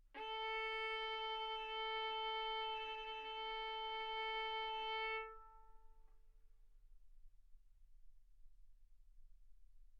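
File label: Violin arco mf A string A4 B4 stereo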